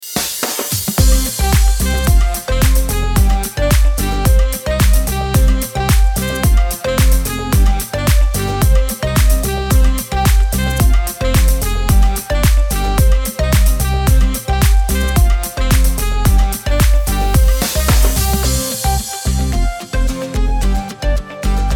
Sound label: background music for vlog video dance funny tropical house